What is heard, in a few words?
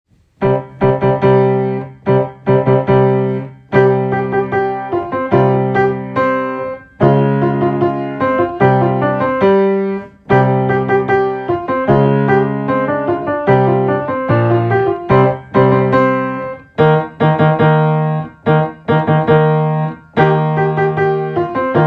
Fanfare; Fanfare by Agay